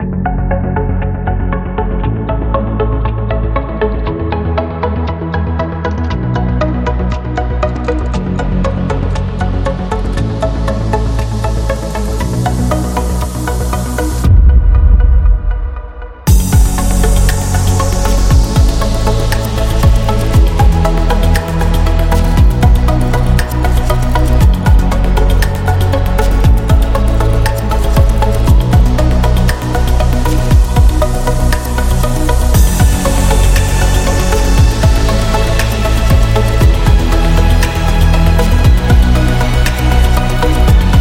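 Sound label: Science Technology, Modern technology background music; hi tech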